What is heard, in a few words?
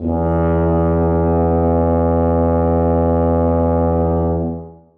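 tuba sustain e2; Sonatina Symphonic Orchestra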